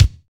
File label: Vint Kick, kicks; Roland BK-5